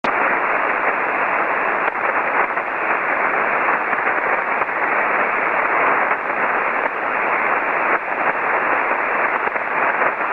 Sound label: Static Short Wave